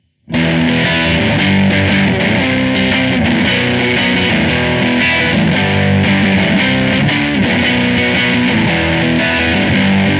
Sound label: crunch, line6 spidervalve; sortie pod